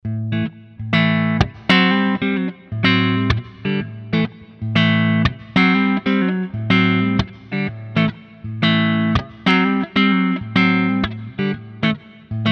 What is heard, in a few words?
Epiphone Humbucker Neck to Bridge